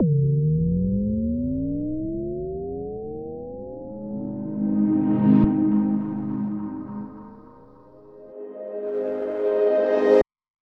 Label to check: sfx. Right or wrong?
Right